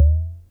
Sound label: SYNCUSSION